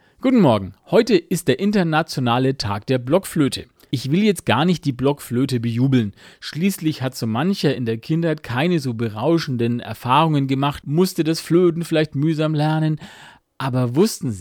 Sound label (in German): ich bin eine Blockflöte; Seidel